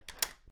unlock; puzzdot2

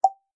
click; hexa blocks

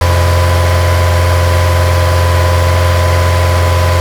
engine loop; zombie road

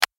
traffic light; traffic command

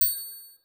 perfect; santa ski

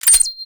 button; baseball bash

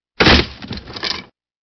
break box; ninja boy

